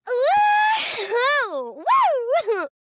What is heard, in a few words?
Woman Dance Happy; Zomboid; Lifestyle